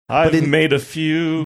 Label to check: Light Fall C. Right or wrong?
Wrong